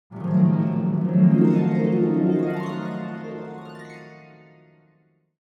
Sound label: Cartoon dream